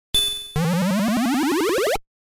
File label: insert Coin; Frogger; Arcade